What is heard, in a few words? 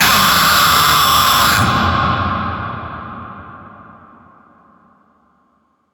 jumpscare; freddy fazbears pizza; FFPS